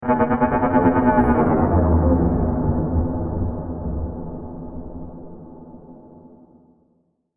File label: Hyperspace Engines Fail, spacecraft; science fiction sound effect; star wars / trek style games